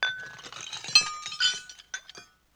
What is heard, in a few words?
Pickup Dishes Tablecloth; Vintage Cartoon; Foley